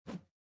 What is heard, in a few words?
whoosh generic